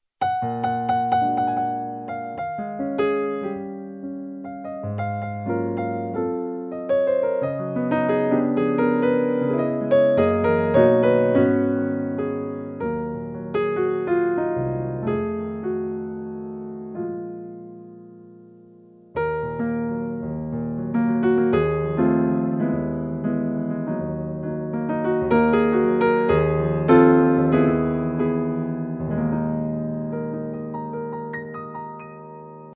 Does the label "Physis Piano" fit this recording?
Yes